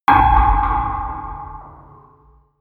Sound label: metal rail